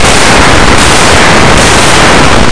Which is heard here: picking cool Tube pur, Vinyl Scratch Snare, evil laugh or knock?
knock